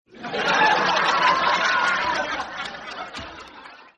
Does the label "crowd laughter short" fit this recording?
Yes